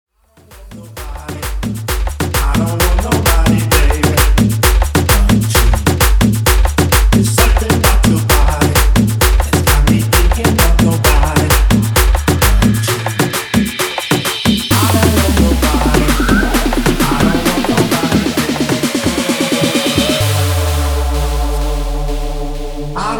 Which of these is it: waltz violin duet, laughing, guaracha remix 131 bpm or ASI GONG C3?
guaracha remix 131 bpm